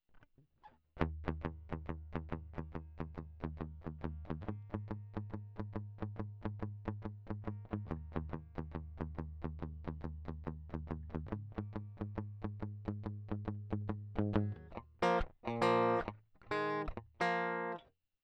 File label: Crunchy Blues